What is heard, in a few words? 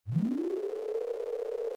swoosh